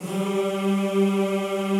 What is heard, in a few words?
G2-R, Classical Choir; Best Service; Extended Classical Choir